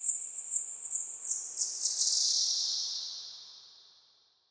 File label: Under City Bat Chatter Distant